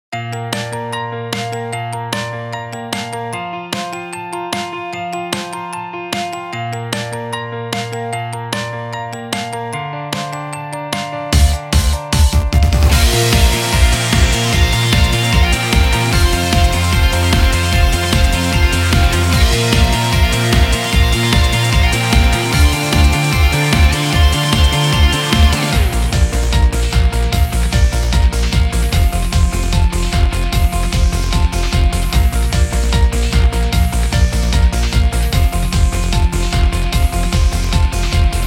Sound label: (Instrumental); Omega Quintet soundtrack